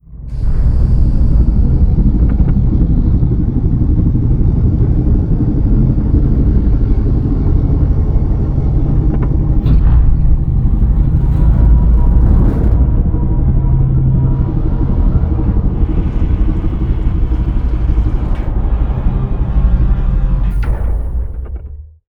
reptilian colossus opening; apocalypse

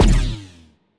mandible hit, weapons; Stellaris; biogenesis

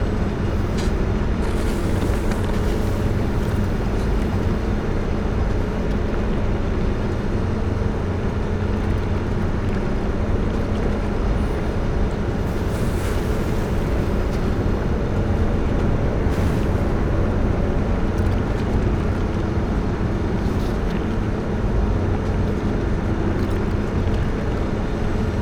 mining station hum; nemesis; Stellaris

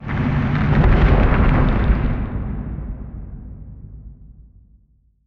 astroid, ambient; Stellaris

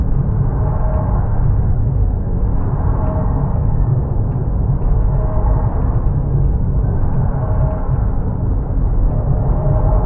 juggernaut mammalian idle; Stellaris; federations

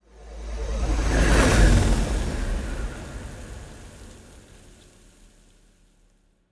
ship destroyer move; ships; Stellaris; toxoids

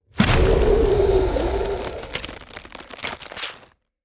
swarm large warship death; Stellaris; other entities

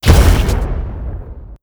xl arc emitter fire; weapons; Stellaris

